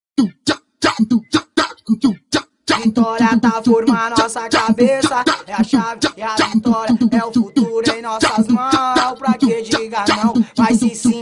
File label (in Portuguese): funk